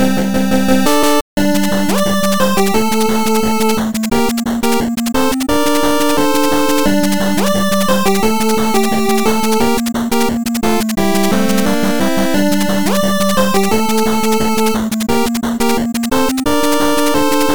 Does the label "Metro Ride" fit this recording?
No